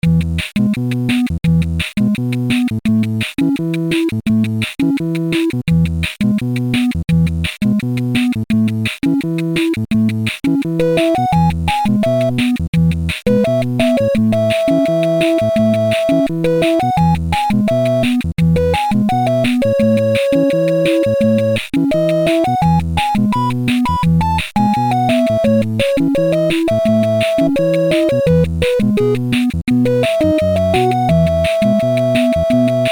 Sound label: 8-bit Village